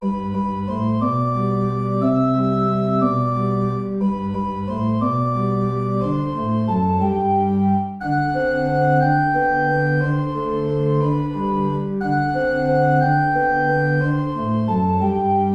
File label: waltz; Carulli Ferdinando